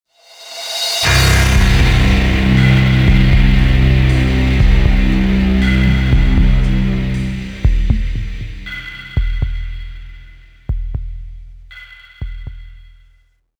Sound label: death music; characters